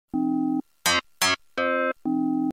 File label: beautiful tone ringtone